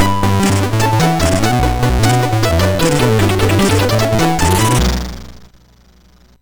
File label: MOS6581R2, sid; Defenders of the Earth